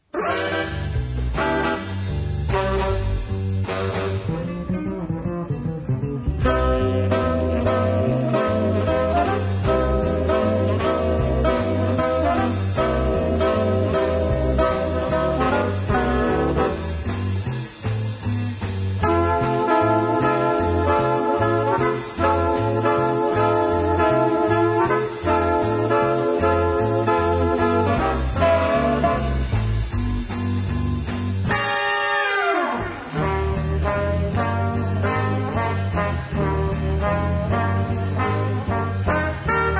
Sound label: Jazz